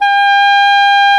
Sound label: Cor anglais; CMI